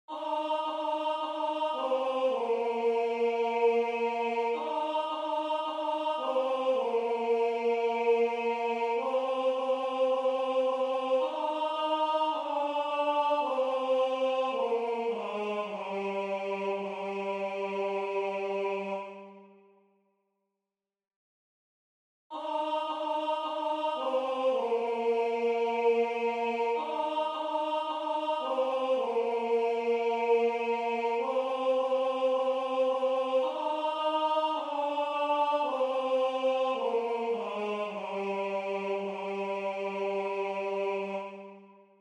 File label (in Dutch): koor Mannenstemmen